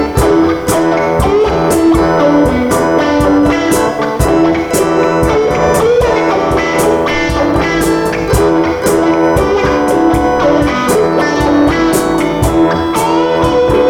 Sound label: Pulsed Funky Jam